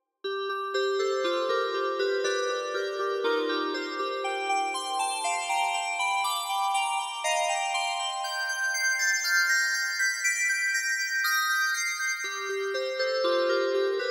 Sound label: Bells; KV331